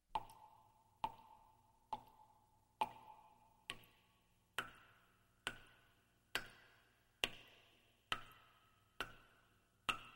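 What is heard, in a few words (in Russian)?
вода капает в душевой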